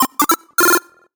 Solo Chip Square Positive; startide

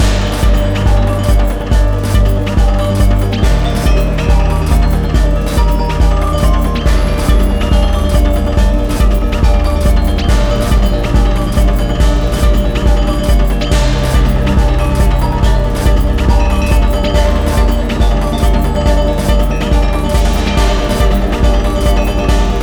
Gamelan techno Medium; rive wreck hack die retry; ps4

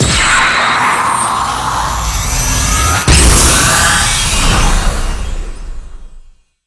Se Battle

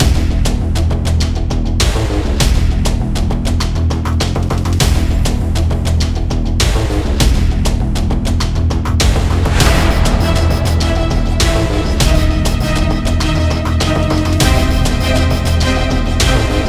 Epic Battle Looped; Dual Blade: Battle of the Female Ninja

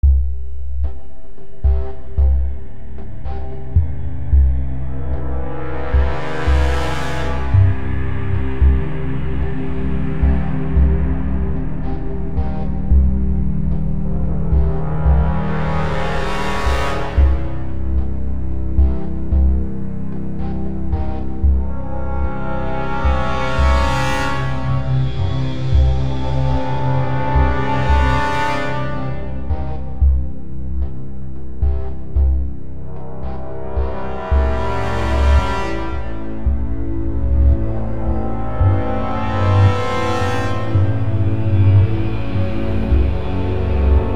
Siege Assault no Drums

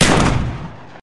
Rifle; MilkChoco

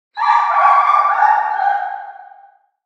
Dog Injured Distant; Prison Architect

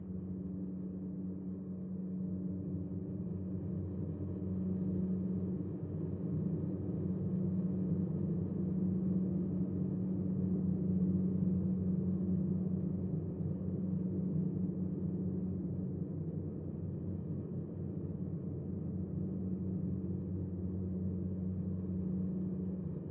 armory ambient; Hellsplit Arena

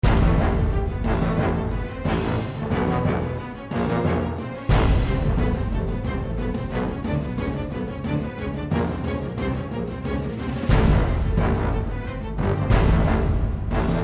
Action

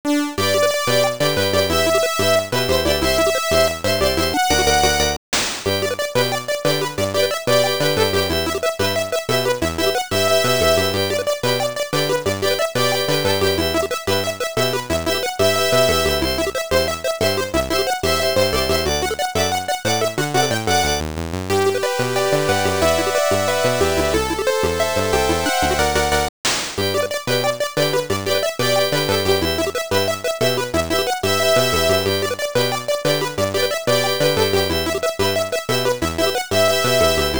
Bonus round music; Hopping Mappy

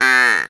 Animal Duck Mono; Blastmorph Pinball; xbox series xs

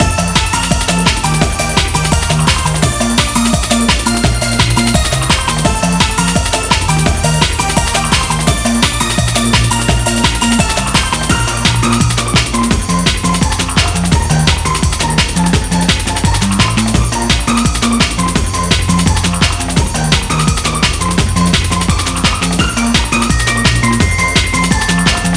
BDAY Candle Blow Music; Spanish

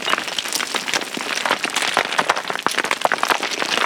Rubble Loop

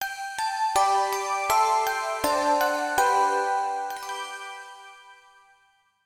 Jingles Inn; Selenophobia

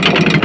drawbridge; birthday party bash; wii; princess room sfx

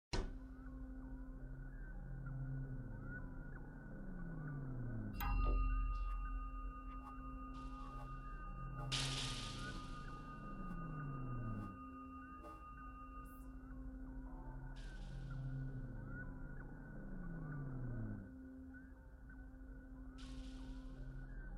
Silent Noise; entropy rising

